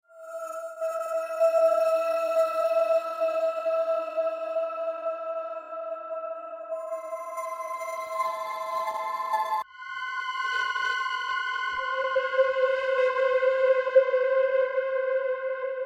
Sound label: space chillout